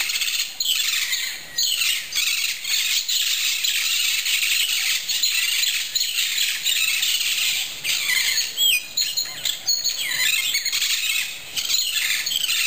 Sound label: parrots